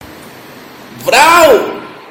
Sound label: vrauuuuuu